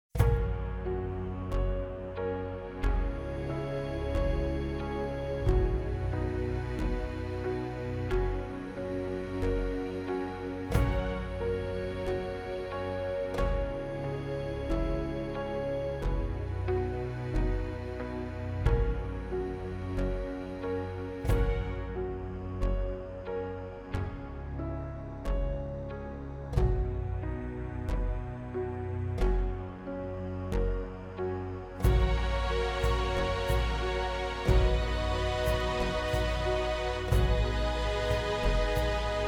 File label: Cinematic Uplifting Atmospheric; amperAI